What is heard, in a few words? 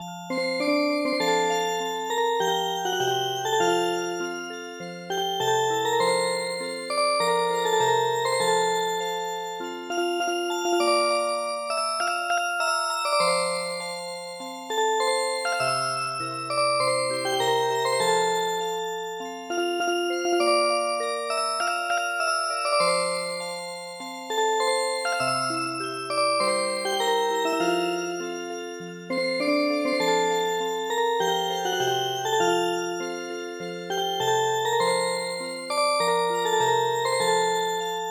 Music Box, traditional; Sarinande